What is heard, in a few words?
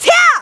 Attack; Toon Link